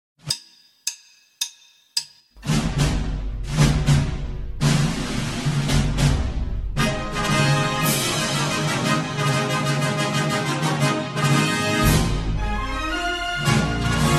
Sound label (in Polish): fanfara